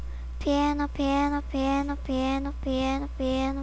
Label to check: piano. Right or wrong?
Right